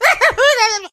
get hurt vo

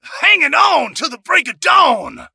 hurt voice; buster

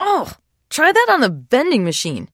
trader pain respond

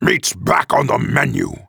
Orc Cleaver action; 普通兵种

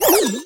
dryfire; chester

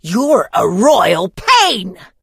evil hurt; pam

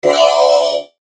mech hurt vo; mortis

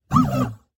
ready beep; 堡垒（守望先锋2）

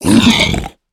piglin death; Minecraft

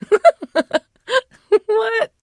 trader laugh; 七日杀; 商人杰恩

